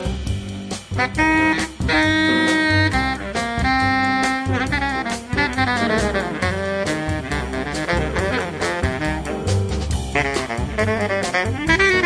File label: Swingin The Samba